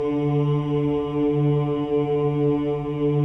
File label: CHOIR OOS D3; Spectrasonics; Symphony of Voices